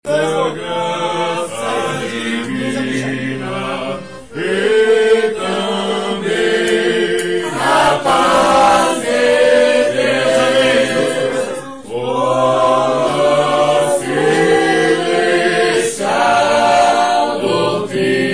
hino cantado; hino 352

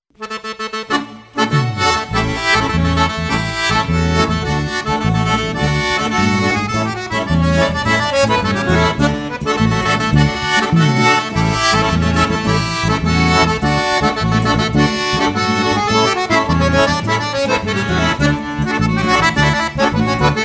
fandango; em corumba

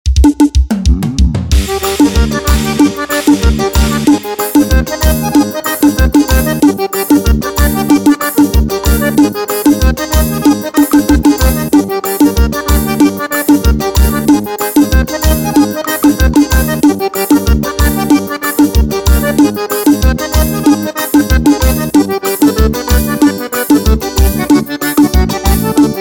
forro xote

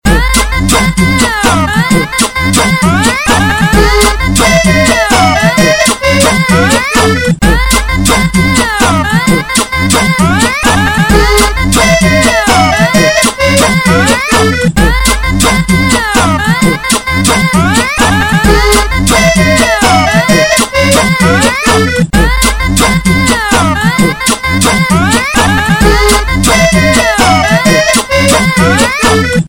base studio pancada mix

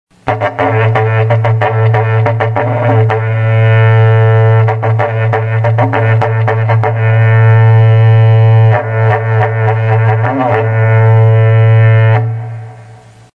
repiques de berrante

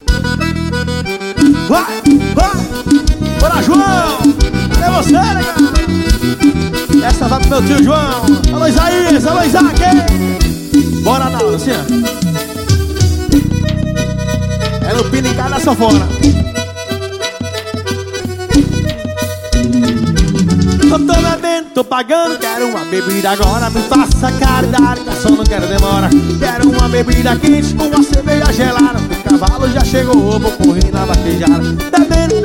forrozão ao vivo; recife

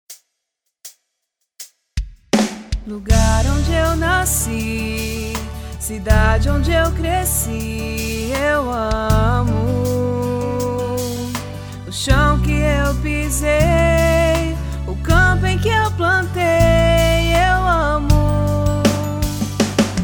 jingle

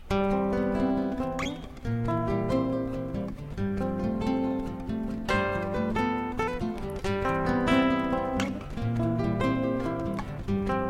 toada

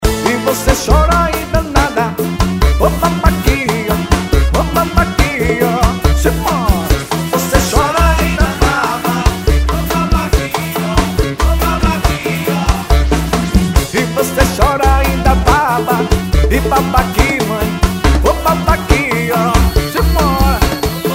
samba ensaio de verao; planeta you samba

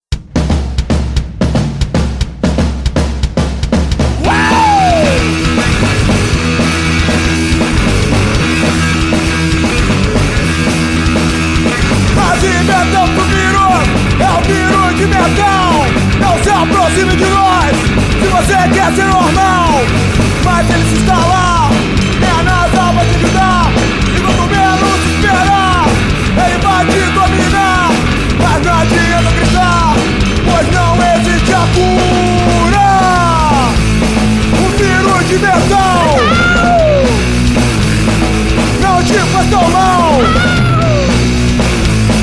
virus di metal

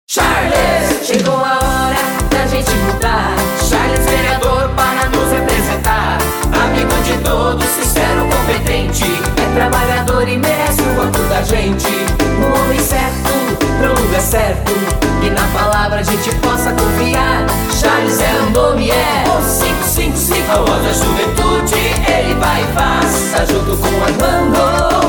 jingle vereador

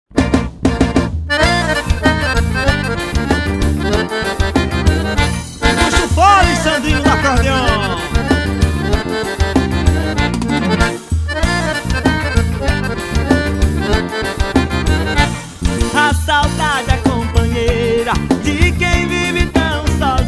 acordeon saudade

